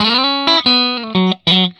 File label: RIFF 120, Vintage Blues Guitar